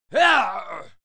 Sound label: male fight attack; schreie; menschen; soundpool